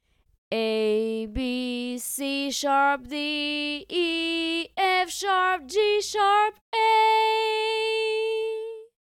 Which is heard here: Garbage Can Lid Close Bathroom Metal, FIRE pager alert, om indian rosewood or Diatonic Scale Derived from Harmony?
Diatonic Scale Derived from Harmony